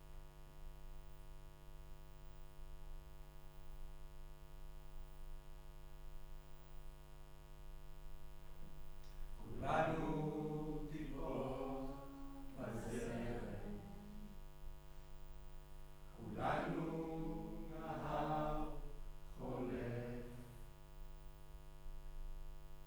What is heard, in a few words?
Room, Choir